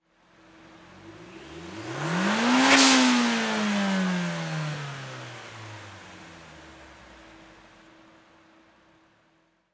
Nissan Silvia Engine Single Rev; Auto, Power Car, High Performance Car Sound: Nissan Silvia. Engine perspective. Single motor rev 03.

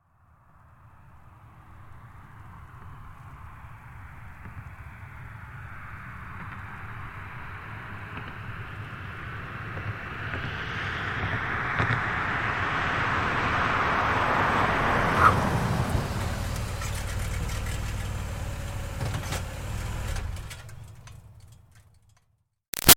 Car Highlander Up Stop Fast; 2005 Toyota Highlander V6; Vehicles; Cars; Big Room Sound

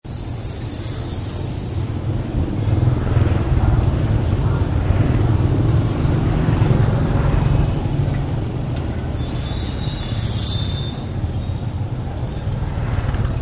Traffic Vietnam; Traffic And Cars; Partners In Rhyme

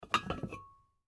Jar Lid Open Ceramic Cookie; Food; Foley; Blastwave

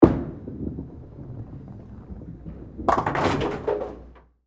Bowling Roll, Ball, Hit, Pins, Follow; Sports

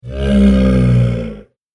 An Ancient Dinosaur; Dinosaur Sound Effects; Monster Sound Effect; Andrew Fleming

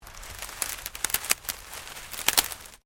Twigs Break; Material components for constructing more complex sounds; Objects

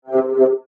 Scanner Beam By, Scifi

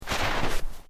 Footsteps Boots Snow Deep Step

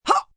Grunt Short Huh, Grunts, groans, impact, female; Groaning; VoiceBox

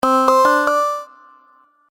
Idea Interface Sound, hit; sound design

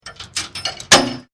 Chain Metal Clanging

